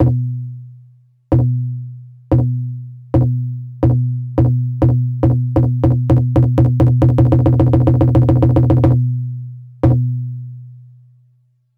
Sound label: Unveiling Drum; Nobunaga no Yabou Tenshouki; FM Towns